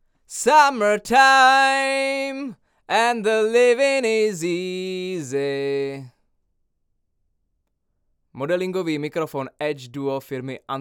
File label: Vocal - C12 Card - Edge modelled; kardioida